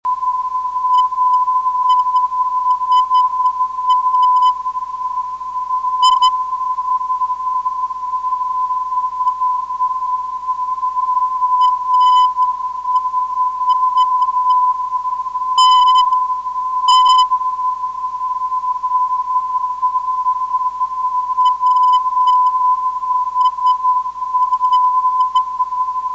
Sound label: 328khz